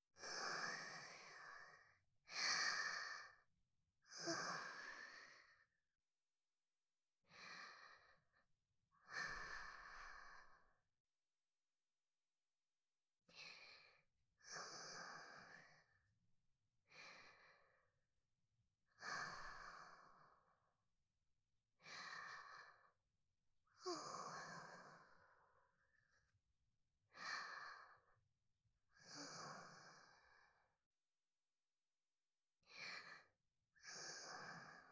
寝息; SEあり

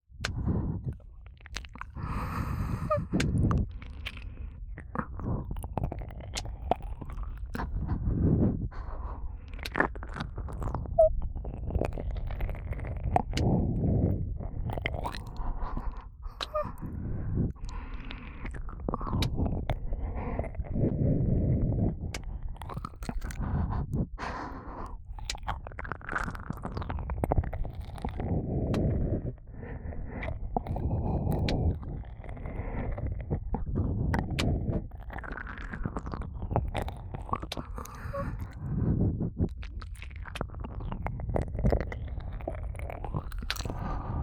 反転 (左) 耳奥舐めねっとりエッチ, ASMR; 白耳; パンパン系効果音版

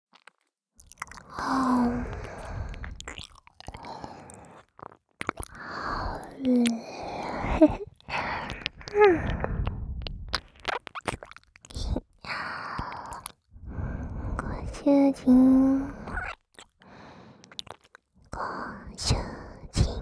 左耳舐め; ディープキス＆耳舐めオンリーオナサポトラック

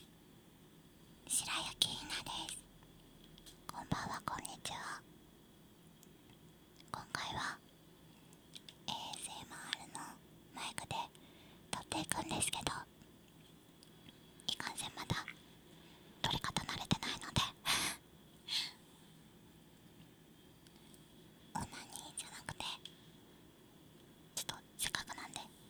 お耳ナメナメ【バイノーラル】, ASMR

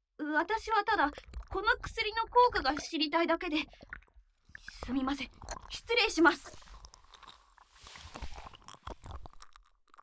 耳舐めされながらどすけべ調合士に手コキ・フェラ・乳首舐め・手マンでポーション被験者にされまくる音声

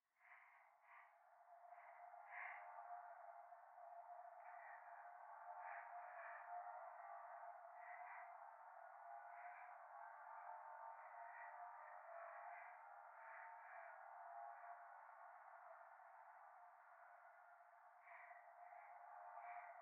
左耳 快感 吐息 車の中; 夢の中

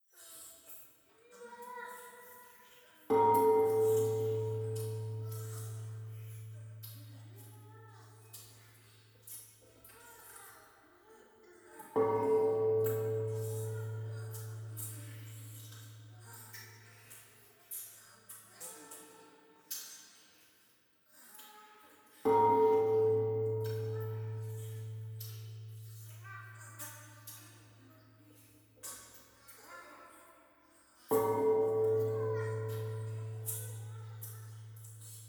トイレ イラマチオ 神社

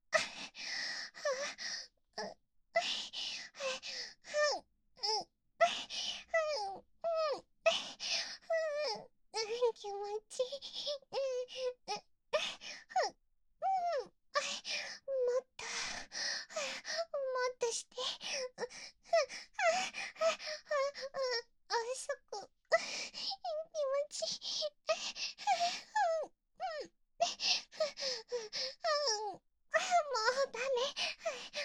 挿入 喘ぎ; SEなし

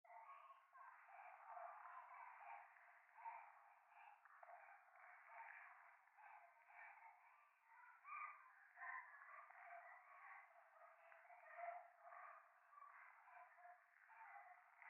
夢の中 可愛い喘ぎ 触手

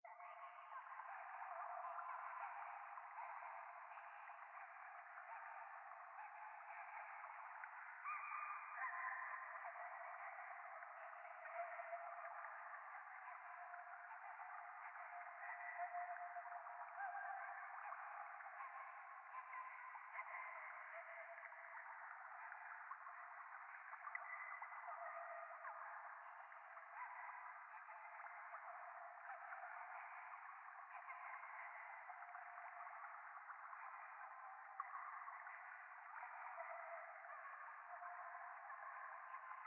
ゴースト 可愛い喘ぎ 川のせせらぎ

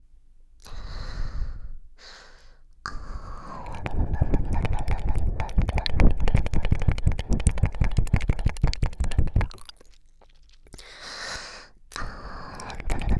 べろべろコース

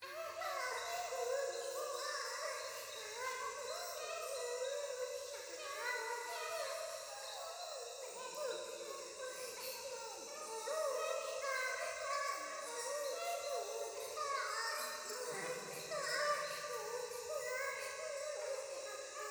可愛い喘ぎ

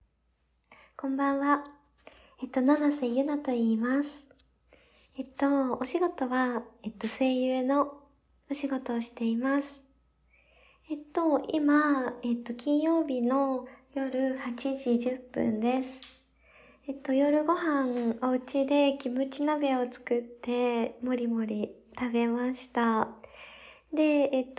おしっこ実演, 夕飯後にトイレでおしっこ編; おしっこ録れるもん。

